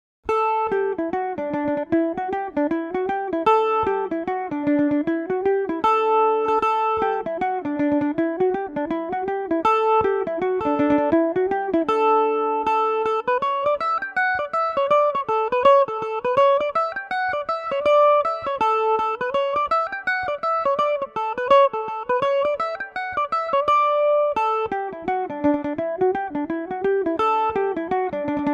mother and child dmaj, mandolin

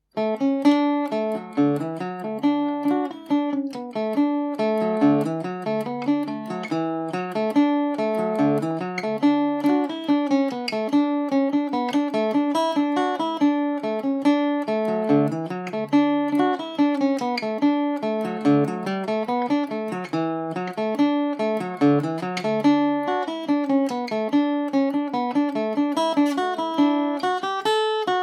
catherine wheel dmaj, mandolin

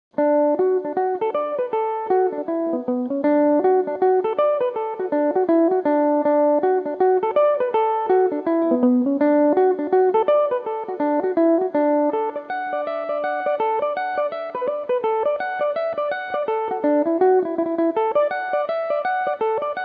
reel D major, Irish mandolin; Road to Town

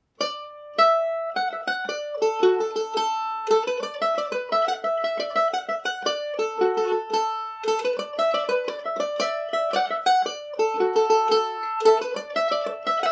jig dmaj, mandolin

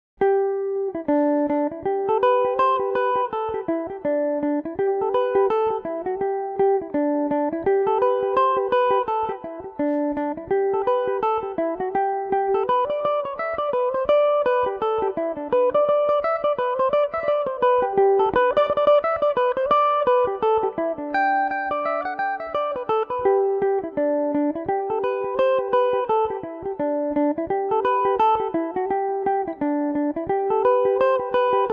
gmaj, mandolin

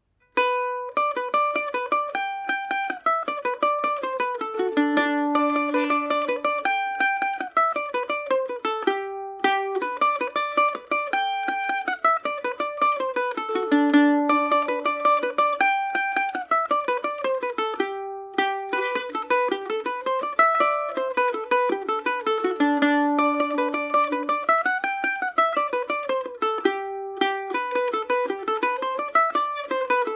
ivy house gmaj, mandolin

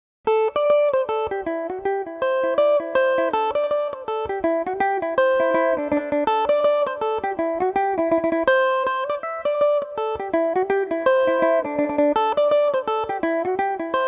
jenny picking cockles dmix, mandolin

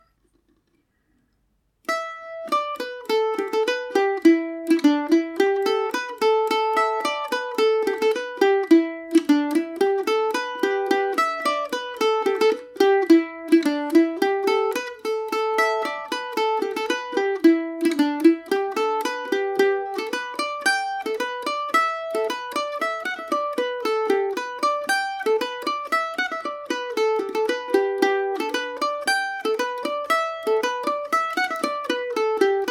eileen o keefes polka gmaj, mandolin

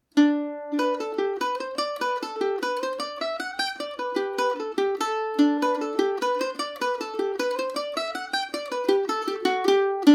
parnell and ireland gmaj, mandolin; Paris Swing Macaferri mandolin

